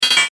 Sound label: Error Tone, Error Tone Multimedia; Web Interactive; Technology; Noise; Electronics